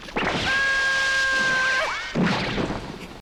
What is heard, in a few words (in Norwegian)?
sniff hyler; mummitrollet